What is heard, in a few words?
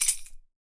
YAQUI SIST, PERC; AKAI S6000 CD-ROM - WorldSounds